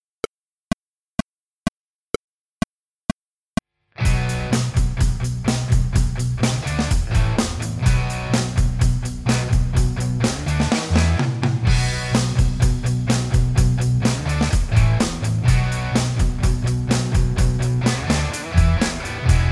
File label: With drums